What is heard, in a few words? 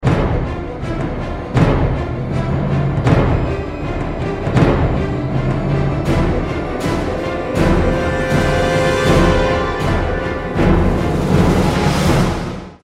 Stab; Black Sun